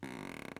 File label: Wood Creak